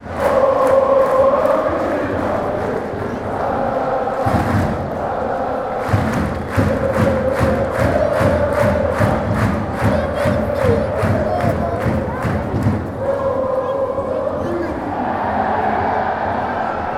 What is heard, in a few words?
woooah, chants